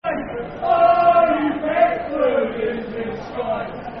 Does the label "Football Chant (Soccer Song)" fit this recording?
Yes